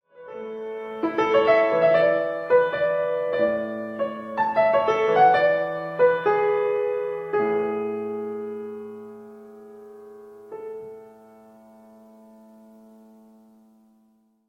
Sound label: cadences; Martines, Maria Anna, Sonata in A major, mvt. I. Allegro, mm. 41-42